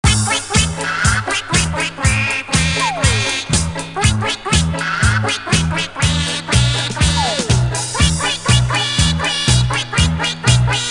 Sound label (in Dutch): Eenden Spel Tune, Kermis Jingles; Kermis Jingles Diversen